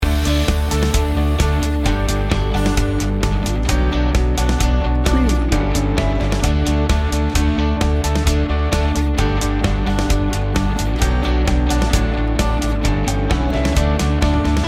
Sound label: loop